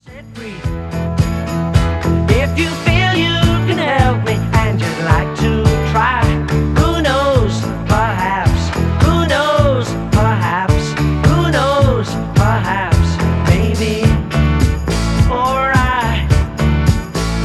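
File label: pops male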